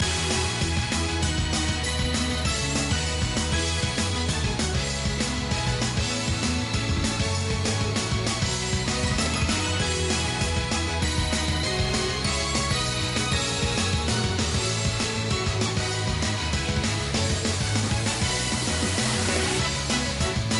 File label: offvocal loop